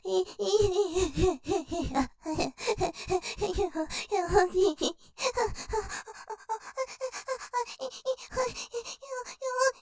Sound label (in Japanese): 喘ぎ, さらにとろとろ・頭バカになっちゃう喘ぎ; ステレオ